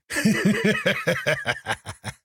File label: laugh